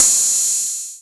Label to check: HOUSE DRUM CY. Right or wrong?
Right